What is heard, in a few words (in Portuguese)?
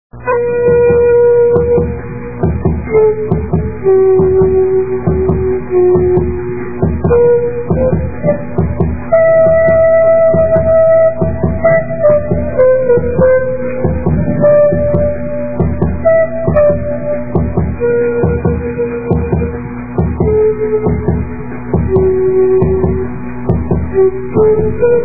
flauta pan